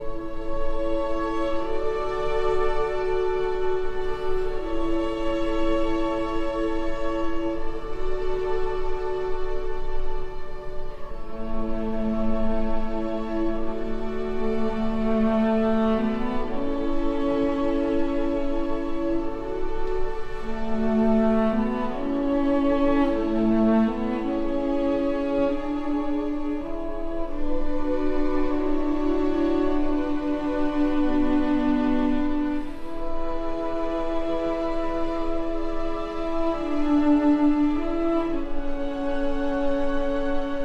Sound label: for cello and strings